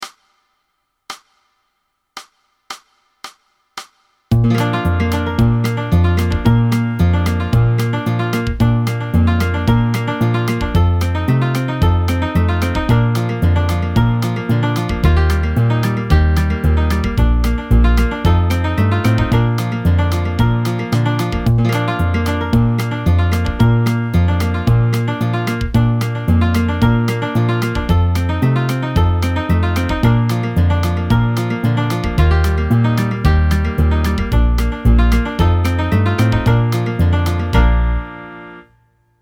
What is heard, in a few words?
sax saints; when the saints